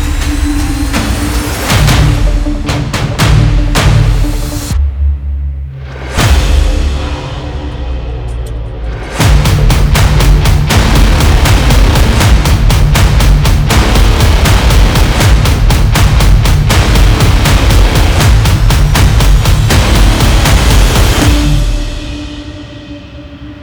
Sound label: tension project; by burak sound bank; tension gerilim vol 36